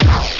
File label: Circle Throw; pokerogue; battle anims